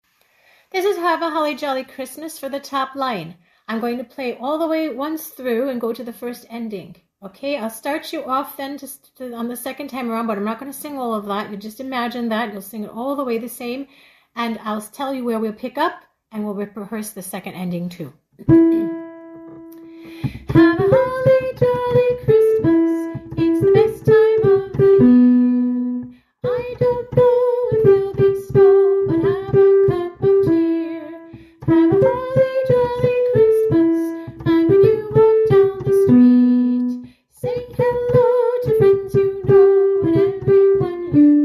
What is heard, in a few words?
high melody, choir